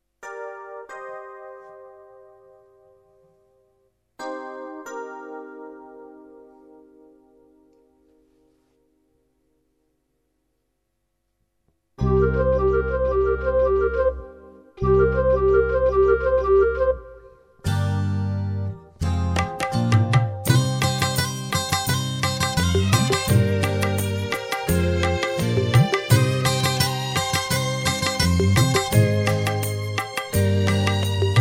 KARAOKE

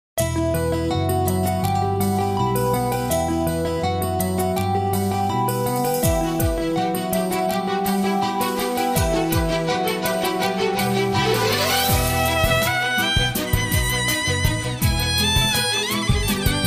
X'an Devotional Karaoke

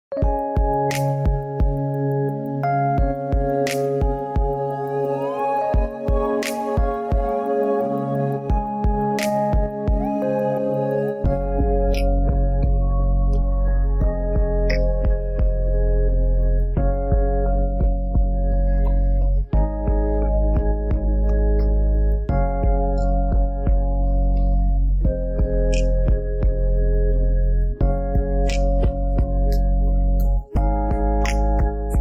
Vocal Removed HD Karaoke Sampl; Karikku Tuned

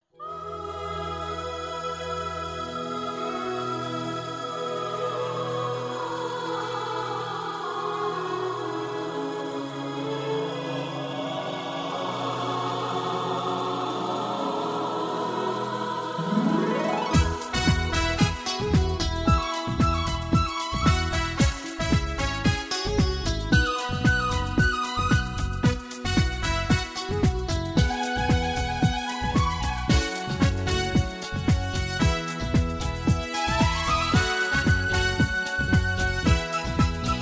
X'an Devotional

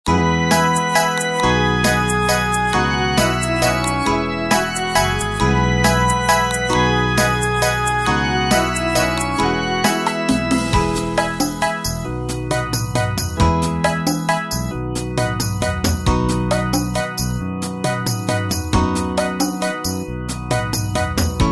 X'an Devotional Karaoke; christian devotional

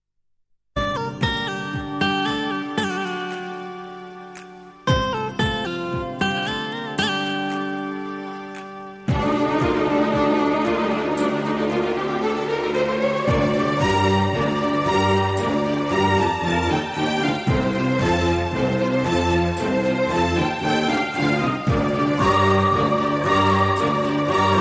Xian Devotional Karaoke

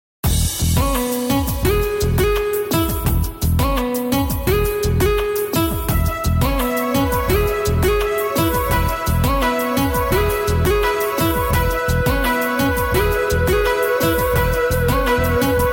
X'an Devotional Karaoke

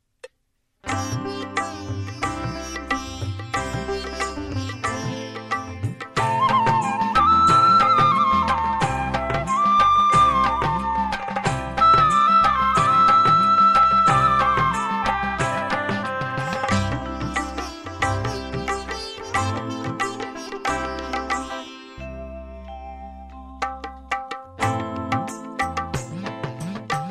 Hindu Devotional Karaoke

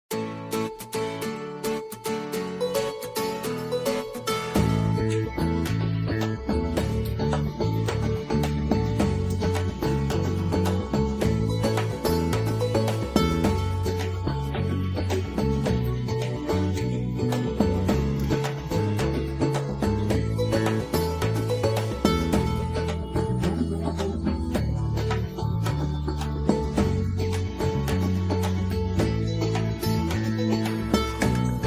Onam Festival Song Vocal Removed Karaok; Mercury Art House

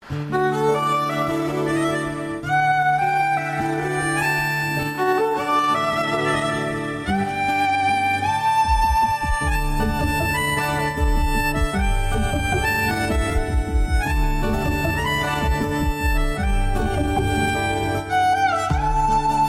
Music Video Vocal Removed HD Karaoke Sample Track

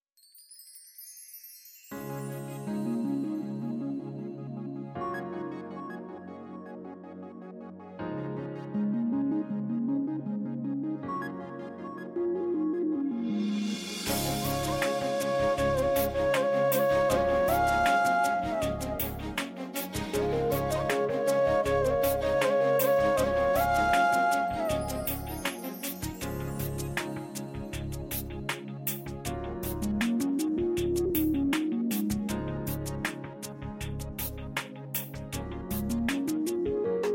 X'an Devotional Karaoke

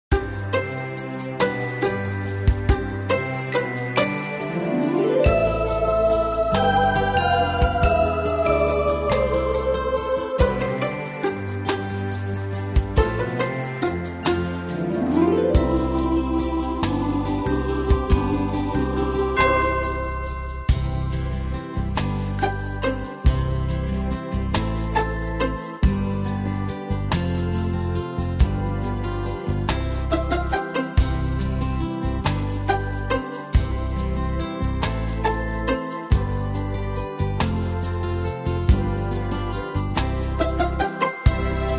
Christmas X'an Devotional Karaoke

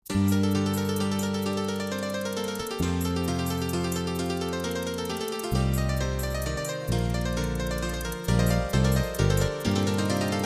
X'an Devotional Karaoke

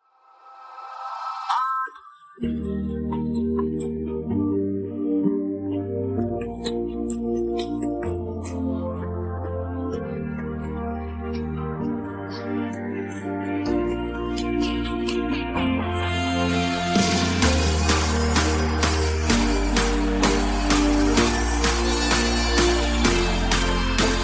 Karaoke

